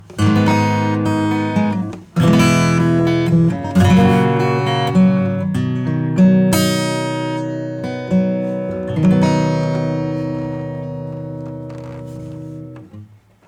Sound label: acoustic; FireMaximizer